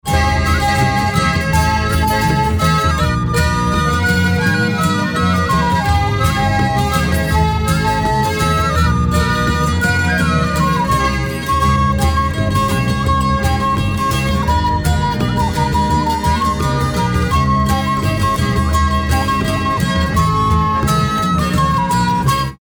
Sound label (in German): Trad. Folk langsam